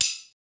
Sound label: metal hit; wood metal SFX